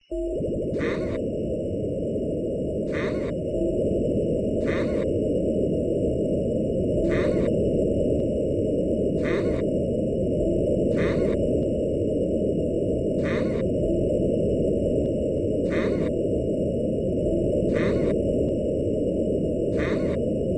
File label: creepy ambience; Gachitale